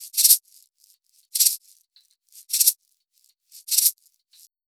PERCS, Roots Funk; USB Soundscan vol.41; AKAI

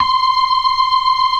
FAST VIB, TREMELO+VIB, Synth and Organ; InVision Interactive; Keith Emerson

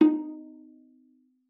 D#4, VIOLA PIZZ; Xsample VOL-5; 90 s Sample CDs

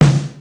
Snare Drum Hard, Yamaha DD-35

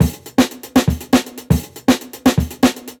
160, Jungle Breaks; Original Jungle Breaks

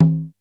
LO CONGA, D110 DRUMS; Crypton - DrumBase5000

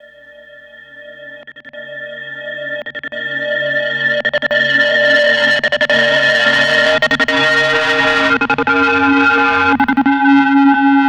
Dissen Melody, Drum n Bass, Synth; KJ Sawka; Drum n Bass & Beyond

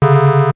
Buzzer, Yamaha DD-35

